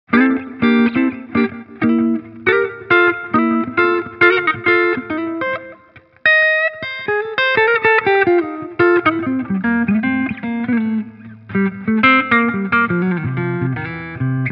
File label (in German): Epiphone Firebird clean Hals